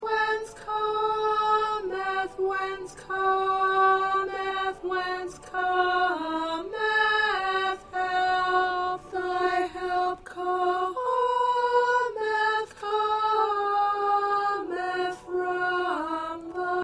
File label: Soprano 2, choir; Lift Thine Eyes